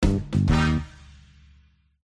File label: lose; new-years